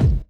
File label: Kicks